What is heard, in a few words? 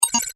Menu SFX: Go Back